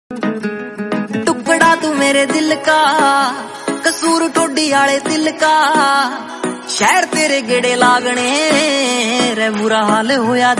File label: romantic punjabi